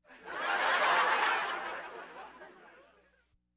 Laugh tracks

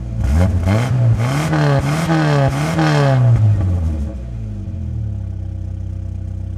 Jeep Wrangler Rev; Trucks

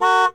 Z28 Horn; Automobiles

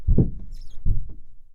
roof body movement; Blind Drive

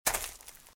Footsteps - Leaves - Walk - Left; RadwinIsland

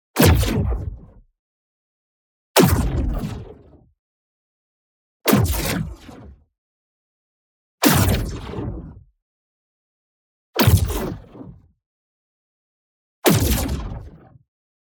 IMPACT SMALL Magical Punch; boom library; Matter

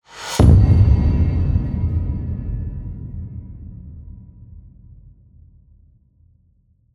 HIT Low, Cinematic Hits Designed

